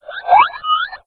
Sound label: alert, houndeye